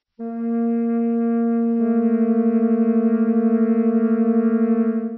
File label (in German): flöte